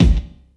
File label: SP1200 KIC, Kix Snares; Hip Hop; E-MU Formula 4000 Series Vol. 1; Hip Hop Nation